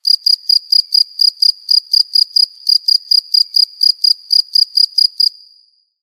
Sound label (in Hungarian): tucsok